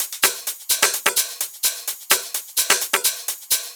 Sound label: Top Drum Loop 4 128; Hexagon